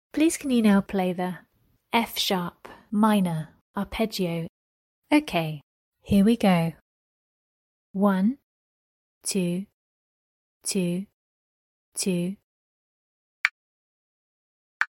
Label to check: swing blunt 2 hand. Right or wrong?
Wrong